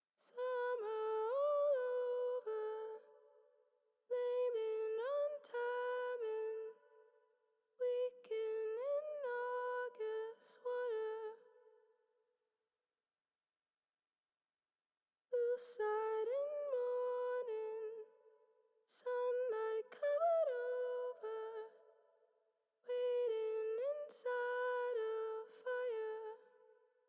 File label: hi voc stem